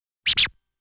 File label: Sound of sliding on the floor; dondokodon